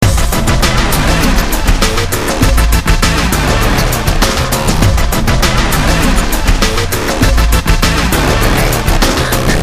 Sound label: loop